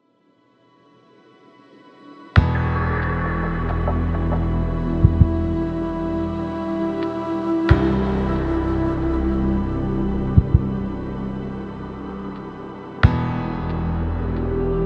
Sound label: vertigoflute